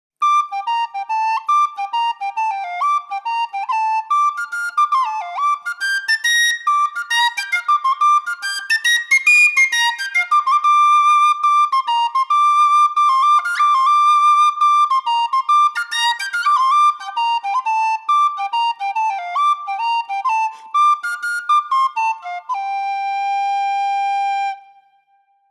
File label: whistle F rosewood